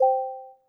hover

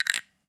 submitted

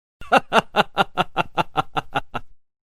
Man Laughing

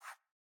Button Hover

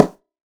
soft hit normal; argon

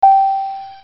notice

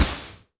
win Line Flash; jumanji

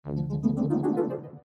boss coming; ThreeHeadedDragon

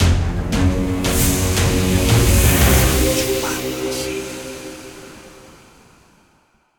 scatter Win; jumanji

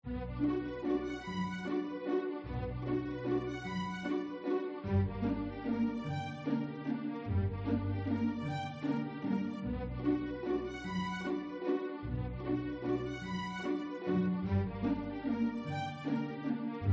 musik; terbium

